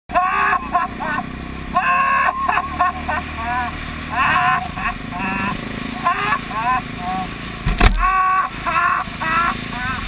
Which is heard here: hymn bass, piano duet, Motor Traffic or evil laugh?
Motor Traffic